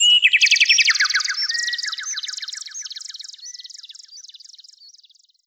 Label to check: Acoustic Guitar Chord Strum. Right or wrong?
Wrong